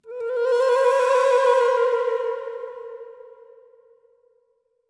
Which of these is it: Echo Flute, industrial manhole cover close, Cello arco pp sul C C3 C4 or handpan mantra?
Echo Flute